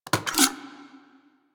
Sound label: Fire Mech, Flame Thrower; Weapons; Warframe; Ignis Wraith